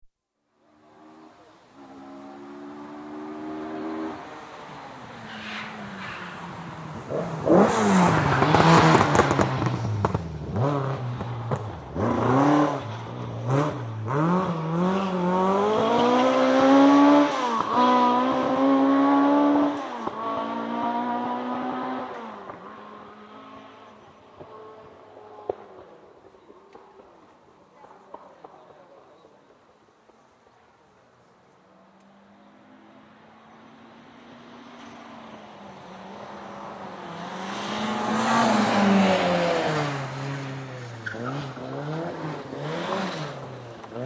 Rally Car Racing, cars taking a 'chicane' double turn; Could use cars separately; BBC